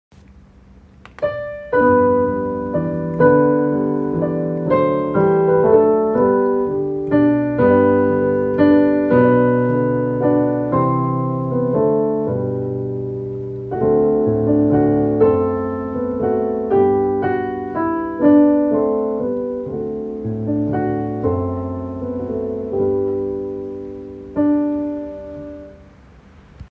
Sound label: Birds at Dusk